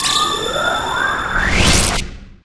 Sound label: calamity gale